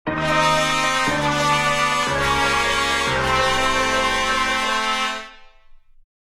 Comedy cartoon wah wah wah fail trumpets

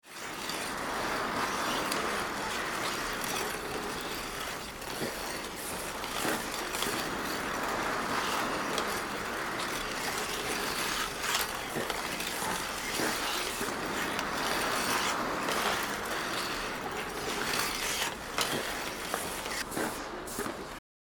Ice hockey arena ambience skating no crowd

